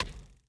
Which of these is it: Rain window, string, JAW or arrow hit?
arrow hit